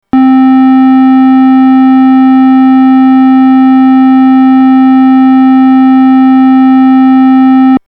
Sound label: pulse, MIDI Synth